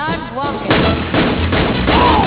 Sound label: light saber